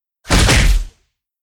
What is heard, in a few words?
attack; hero